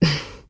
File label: hmm